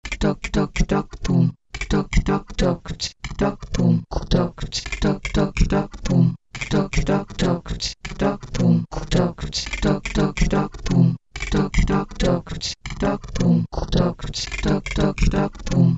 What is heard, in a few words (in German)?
2 stimmig